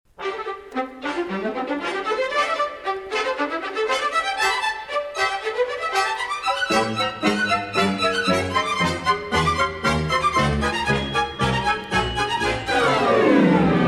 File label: Light Mechanical Bridge, Cartoon Music; Cartoon Music Discs